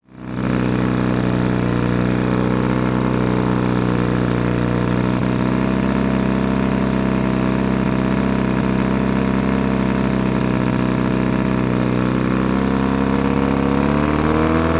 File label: boat outboard motor; transportation